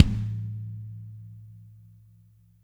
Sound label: KIK; Spectrasonics; Burning Grooves